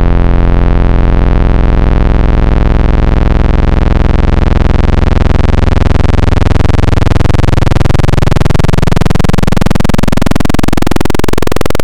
Analog PWM Custom, wavetable

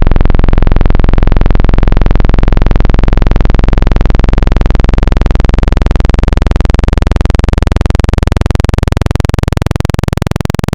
Analog PWM Saw, wavetable

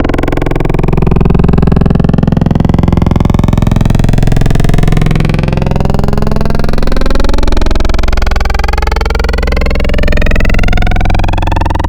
Growl, Wavetables

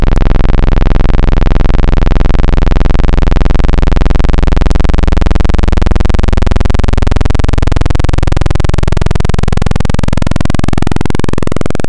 Analog PWM Custom, wavetable

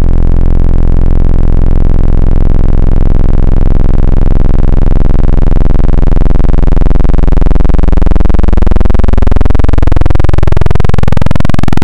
Analog PWM Custom, wavetable

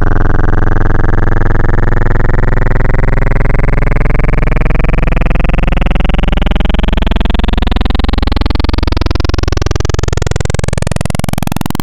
Analog PWM Custom, wavetable

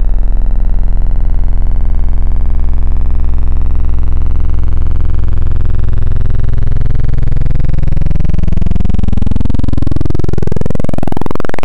Analog PWM Sub

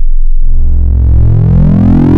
Dist Lin. Fold, wavetable

